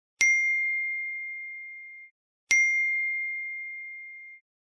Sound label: imessage ding